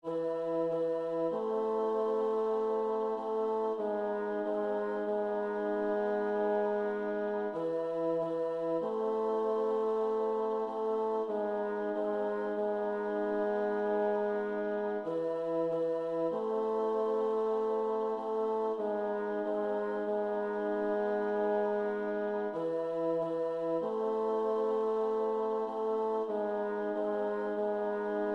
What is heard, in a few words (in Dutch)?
bas